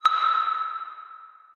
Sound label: chime